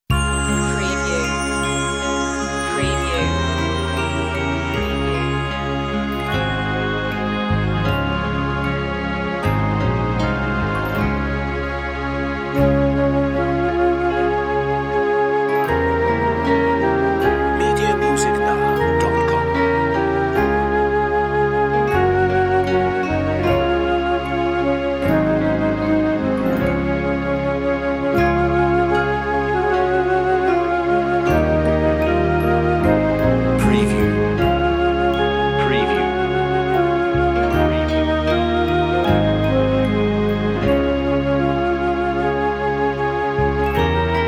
rocking carol

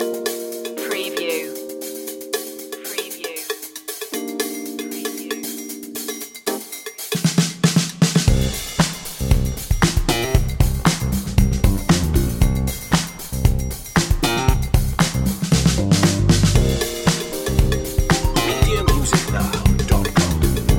soul fusion

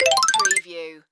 Casino Slot Machine Win

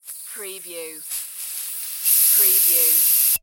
Aerosol Can Spray; sound effects bible

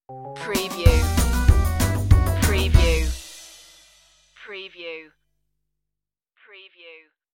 the spooky pumpkin head stinger

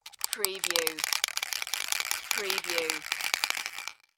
Spray Paint Can Shake

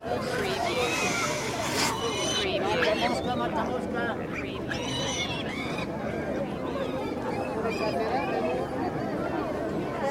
animal market

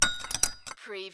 Stirring in a cup with a tea spoon

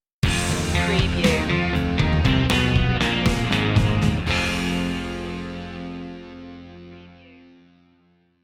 escape stinger

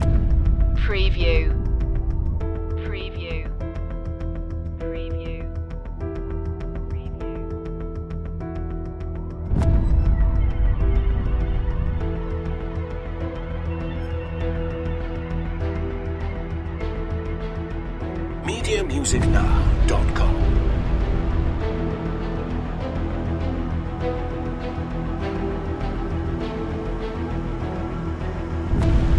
building drama loop

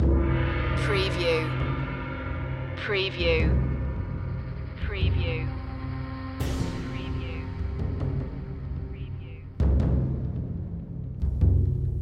taiko triads loop

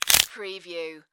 Bone Break

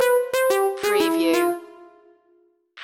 retro video game ferris wheel stinger

